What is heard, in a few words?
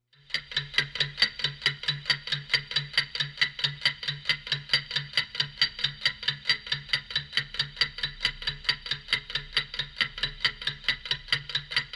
Clock Ticking